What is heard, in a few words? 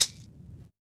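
Vinyl Shaker; drum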